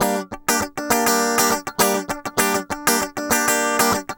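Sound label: Guitar, G; Best Service Gigapack 2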